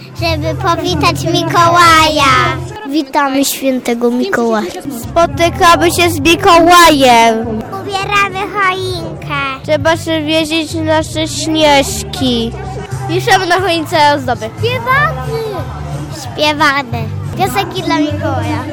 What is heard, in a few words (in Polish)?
choinka dzieci; przedszkolaki przygotowaly tarnow na swieta